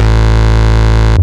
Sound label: Bass Root A